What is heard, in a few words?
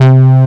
K4 Bass